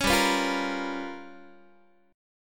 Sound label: G 7th Sharp 9th Flat 5th, Guitar; G7#9b5 strummed; JGuitar